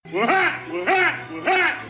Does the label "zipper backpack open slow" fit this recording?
No